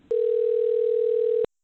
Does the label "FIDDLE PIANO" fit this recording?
No